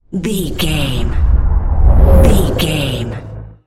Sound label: cinematic deep bass pass whoosh